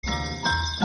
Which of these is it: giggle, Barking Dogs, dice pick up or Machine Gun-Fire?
Barking Dogs